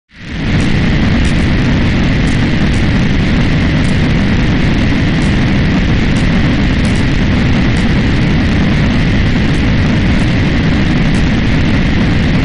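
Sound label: fire; kasai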